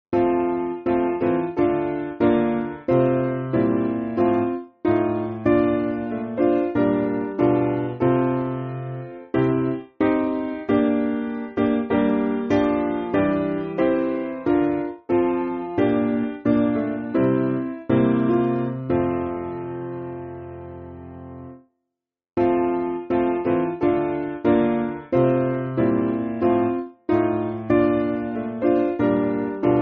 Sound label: O For A 1000 SPiano; Selby; O for a thousand tongues to sing